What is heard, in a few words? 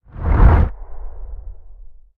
from below rumble; hades; smite